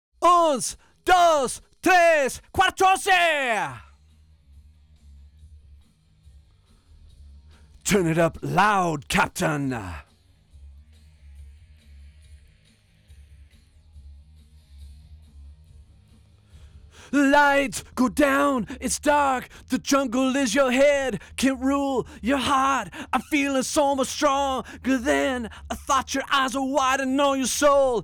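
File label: vocal; Pro Tools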